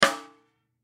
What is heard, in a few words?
Drum Snare; Snare 14 Inch Pacific Rim Shot; Drum Kit Sound Effects; Blastwave